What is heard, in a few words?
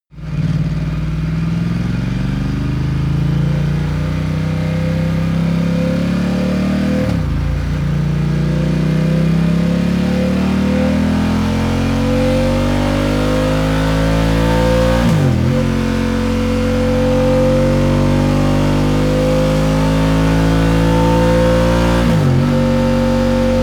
motorcycle ducati cruiser deceleration acceleration medium long